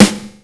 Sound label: normal hit clap